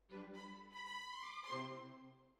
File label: Hall, Jazz for piano